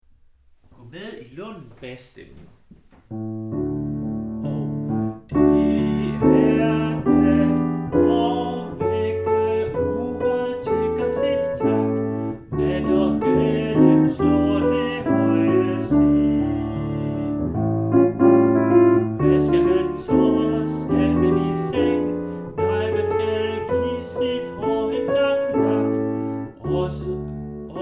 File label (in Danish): Gå med i lunden, bas; harlev koret